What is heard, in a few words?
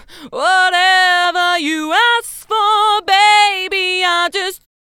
Vocal Editing Matched Edit Aligned